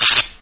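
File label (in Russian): треск от затвора камеры фотоаппарата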